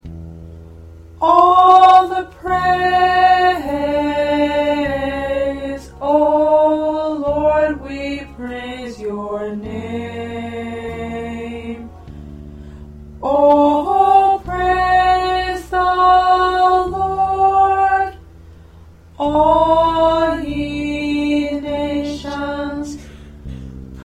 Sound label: P Worship Medley Tenor; practice tracks